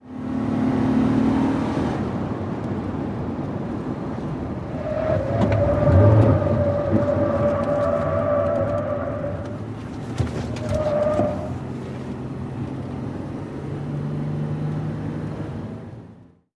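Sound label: SUV Truck Accel Corner Interior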